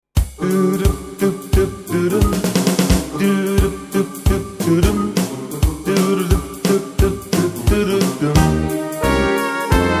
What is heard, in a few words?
instrumental Saxophon